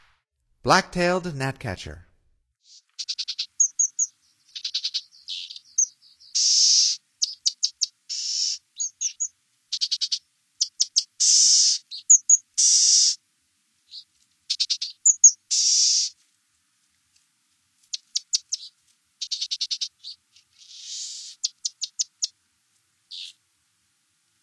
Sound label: Black-tailed Gnatcatcher, Songs of Birds of North America; Stokes